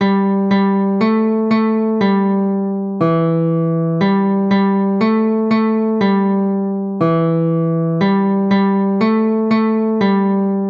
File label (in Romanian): muzica; Hoppe Reiter